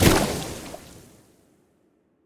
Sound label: Water Tank Footstep; AudioWizards